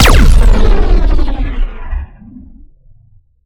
Shot Large Laser Electron Cannon

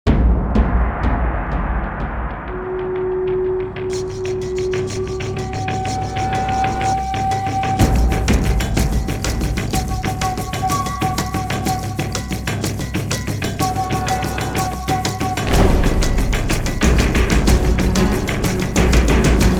Wilderness

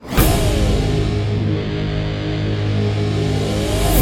WarmUp Countdown Intro; PWND

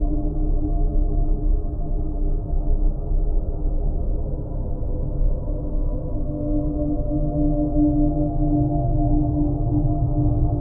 Scary Space; Five Nights at Freddy's; FNAF 2

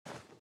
run sand

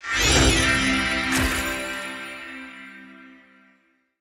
Sfx - Revive; Neon Souls; PS4 PS5 switch windows xbox one xbox series xs

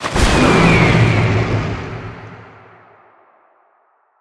Field Mat Tap; Yu-Gi-Oh! Master Duel

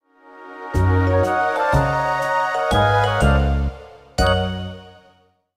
Date (Success)

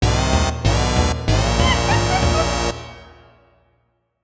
Bonus Lose; Donkey Kong 64

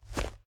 Footsteps Dwarf Dirt; We Are the Dwarves

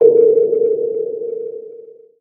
Scary Drop

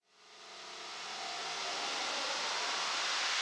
Build Up

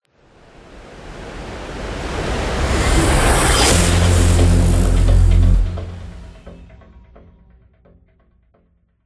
Transition End

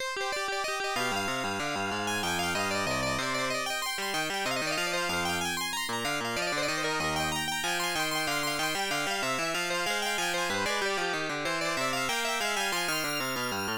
Baroque; Digan no Maseki; PC-8801

